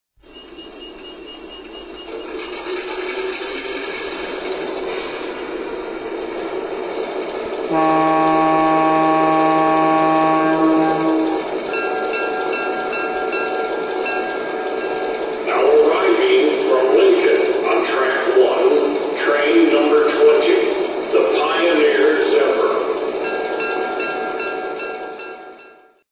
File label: Zephyr, trains